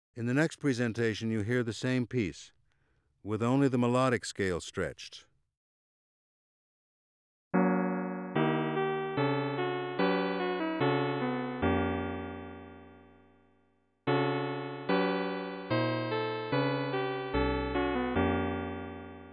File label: Tones And Tuning With Stretched Partials; ASA auditory demonstrations